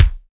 Kick Dry

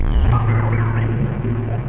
Under Water; Sound FX

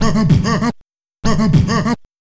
Scratch

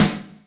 snaredrum, Yamaha RM 50

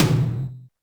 Yamaha RM 50 Toms

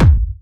Medium, kicks

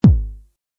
kick

